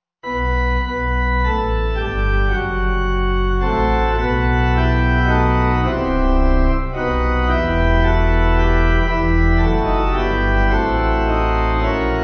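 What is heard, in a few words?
Pipe organ, church music backing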